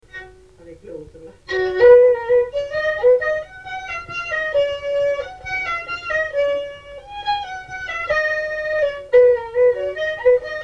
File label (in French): marche